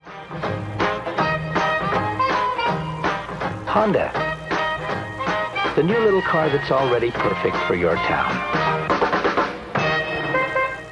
Jingle; Honda

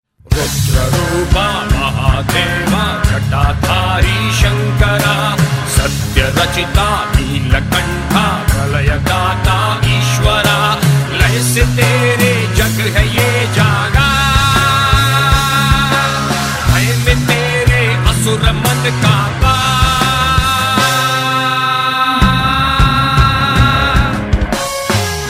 shiv tandav

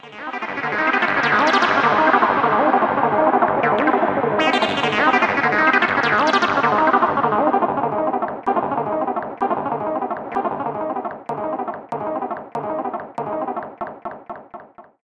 Acid Sustain

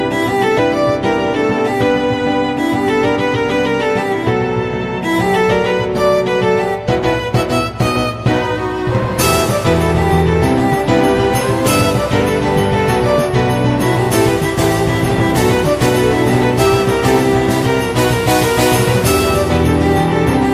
Violin

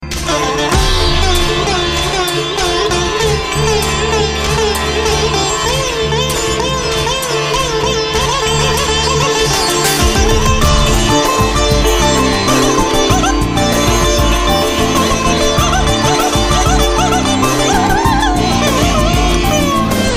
rockin sitar tune

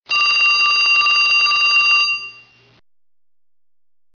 Old Phone Ringing

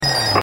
sms; need for speed

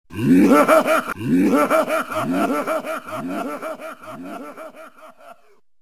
Laughing

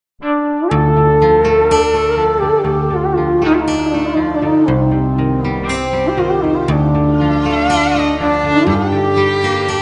Arabic

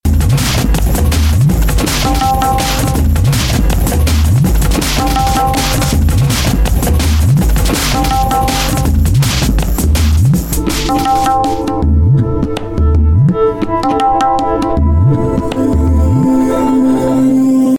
Cascade Tabla mix

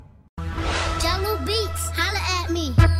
Jungle beat

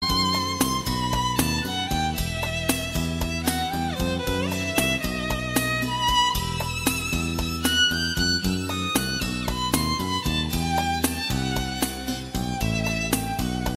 Violin

